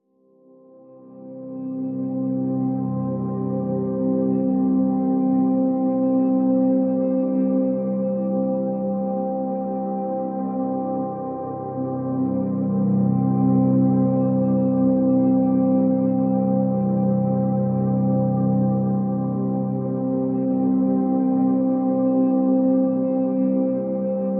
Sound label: Pads (C)